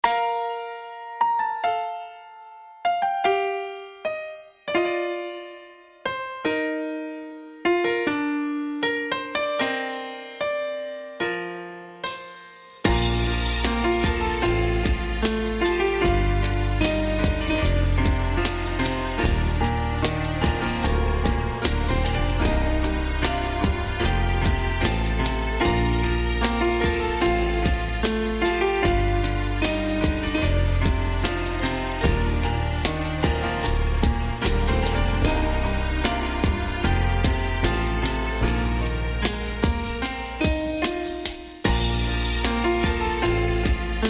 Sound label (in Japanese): ジングル 水滴、浸透 BPM75